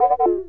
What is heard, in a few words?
General close